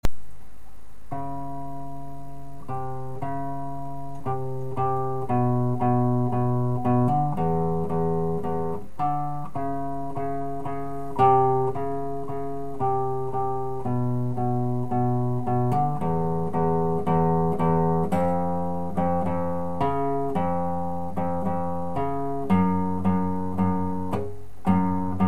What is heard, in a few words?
tango